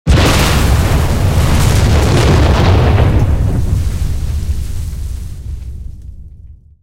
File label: explosion naval; War Thunder